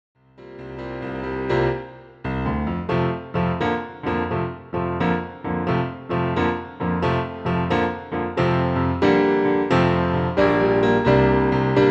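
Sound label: medium high accompaniment; Praise My Soul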